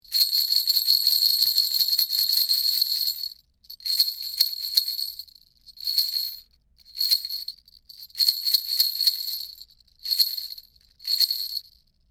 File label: toy bell